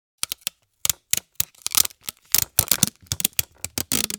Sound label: Scotch tape dispenser handling pull and tears